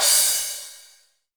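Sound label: cymbal, real mega drums; Best Service; Real Mega Drums VOL-1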